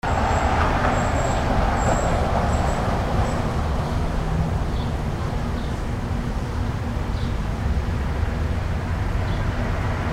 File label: traffic nearby vehicles; ca sjc